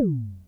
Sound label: SYNCUSSION